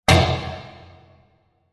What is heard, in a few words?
Plastic Impact; Blasts; Impacts; Crafted Signals